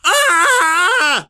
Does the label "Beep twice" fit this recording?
No